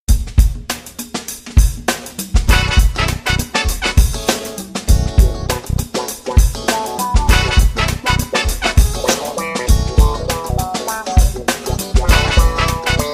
funk old school

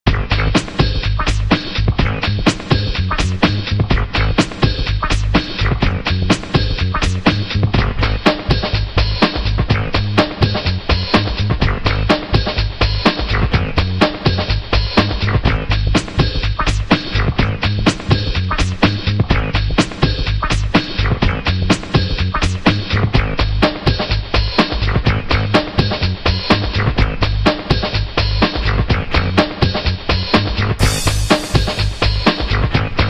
gutter groove

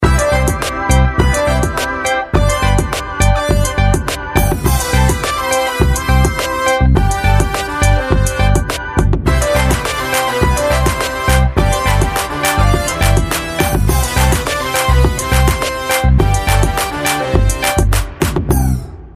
party

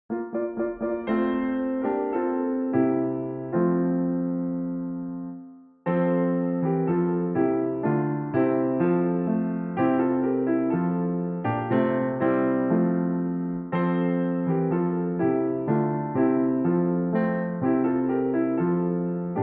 Deck The Halls Piano Version

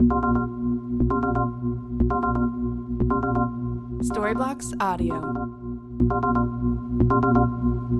Ambient House Arp Pad 120BPM C

